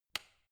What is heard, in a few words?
switch turn on